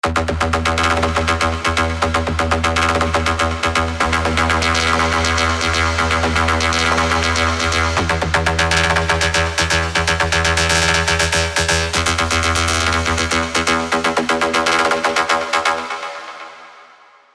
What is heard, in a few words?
Stab In Flames; melodic leads; revealed serum 2 melodic leads vol 1